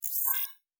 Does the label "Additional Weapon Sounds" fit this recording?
Yes